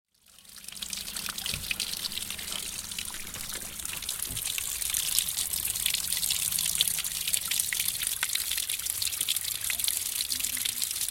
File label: water